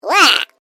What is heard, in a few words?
Created Creature Small Yuck, Alien Sound Effects; Created creatures, vocalisations and pretend speech